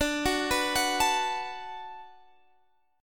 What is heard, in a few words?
D 6th D down Guitar Drop D, chord